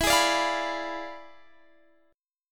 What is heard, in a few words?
E 7th Flat 9th, chord; E7b9; strummed; x,19,18,19,18,19